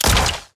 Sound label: turret shot; Ion Shift